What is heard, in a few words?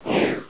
swoosh out; Stealth Combat